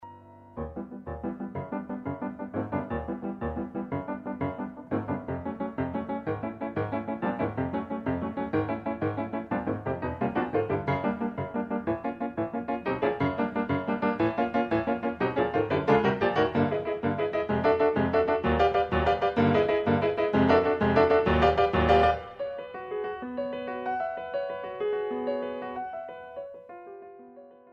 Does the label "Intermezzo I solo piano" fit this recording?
Yes